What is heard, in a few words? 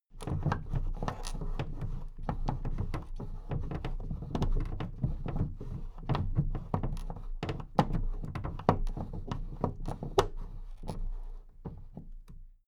big plastic case movement handle rumble